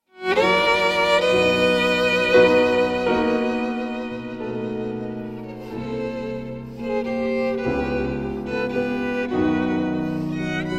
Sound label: caprice viennois for violin and piano; Fritz Kreisler; op 2